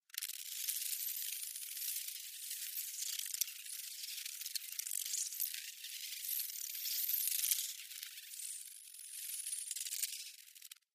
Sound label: Insect Amb Mvmt Buz, wings